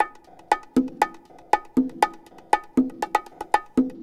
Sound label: CONGA LOOP, Perc Loops, Techno Trance